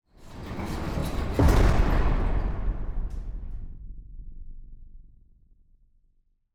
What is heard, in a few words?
Door slam; Machinal